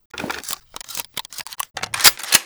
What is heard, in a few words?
shotgun reload; Reborn Shotgun skin